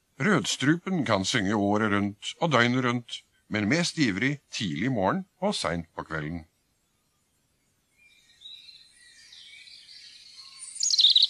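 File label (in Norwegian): rødstrupe, fuglelyder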